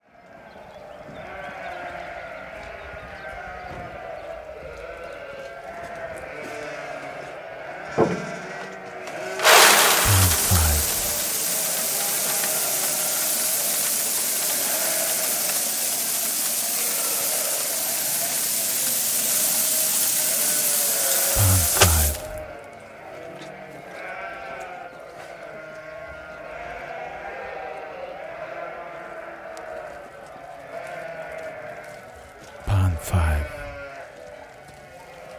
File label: granary